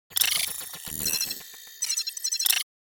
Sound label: Futuristic data